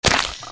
Squish; Red Alert 2

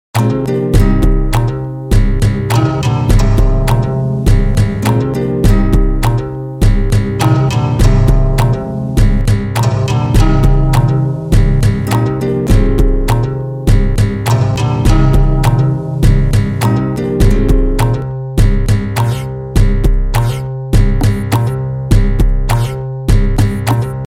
Guitar Tune

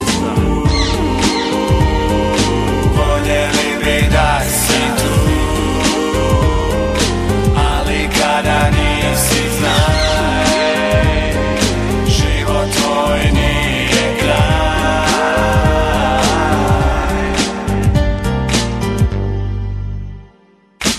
Hip Hop

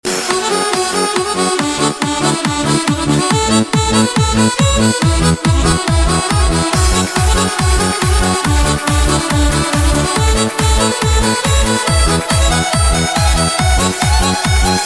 Cool Tone

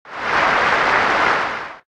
Tennis crowd

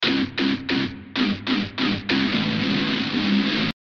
Riff

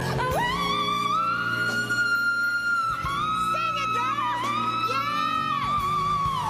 High Notes

